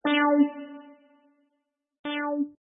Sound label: whole note delay